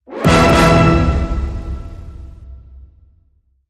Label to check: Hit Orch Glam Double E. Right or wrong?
Right